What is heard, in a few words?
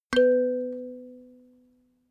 notification kalimba octave low